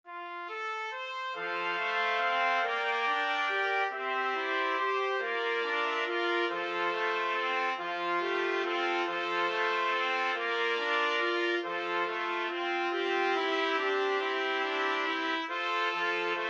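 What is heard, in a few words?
4 trumpets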